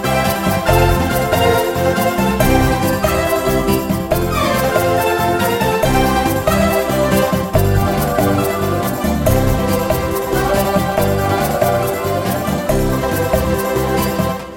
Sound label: Beautiful Sounding Song